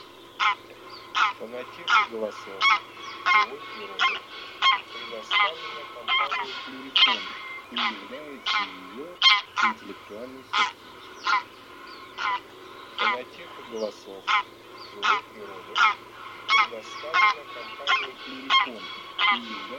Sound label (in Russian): Гусь серый Oca Selvatica; plurifon